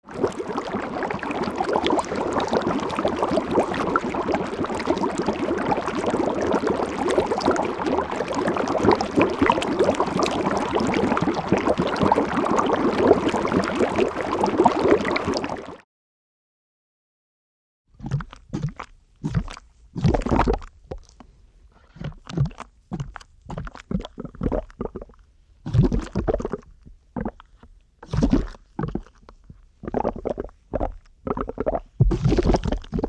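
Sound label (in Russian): звуки пузырей сборка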